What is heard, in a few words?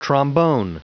trombone